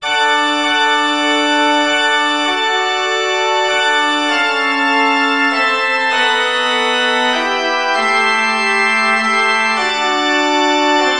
forspil; det kimer nu

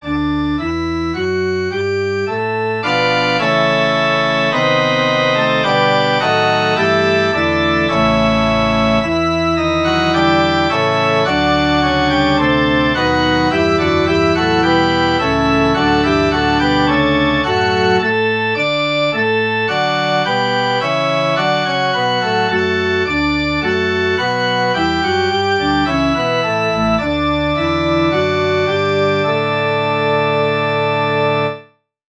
forspil; dejlig er den himmel bl

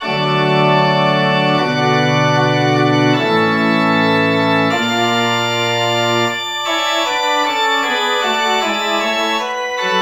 forspil

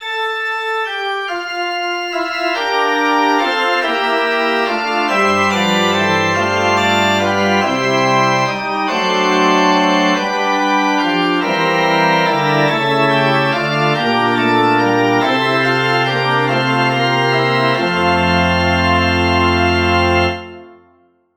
forspil; kirken er som

